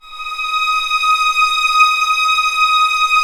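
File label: violins, piano; String Master Series; Vlns 7 Orch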